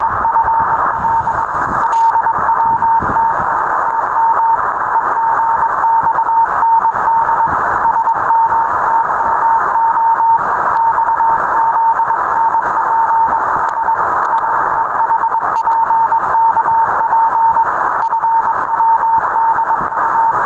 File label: CW 8779 kHz